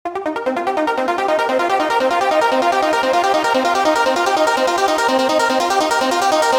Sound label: Plucky Lead, eurodance 90s; eurodance 90s vol1; Preset Bank for ABX3: EuroDance 90s Revival